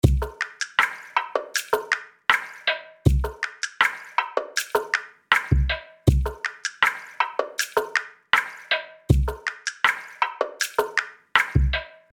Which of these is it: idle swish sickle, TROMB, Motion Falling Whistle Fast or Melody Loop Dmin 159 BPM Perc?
Melody Loop Dmin 159 BPM Perc